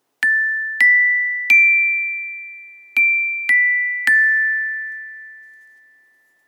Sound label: Rubber Club